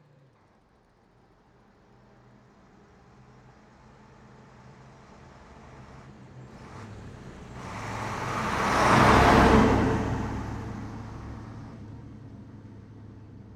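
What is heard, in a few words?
Ural 4320 exterior horn passby; Pole Position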